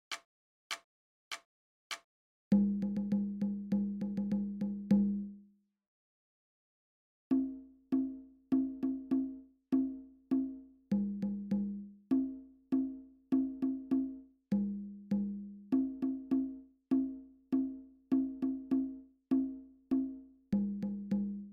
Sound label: Bongo; Wilhelm Tell